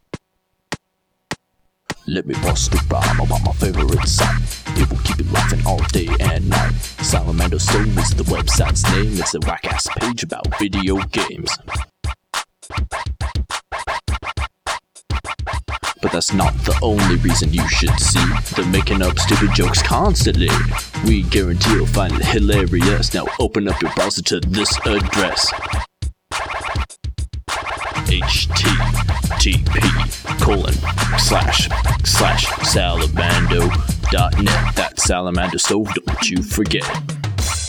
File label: Rap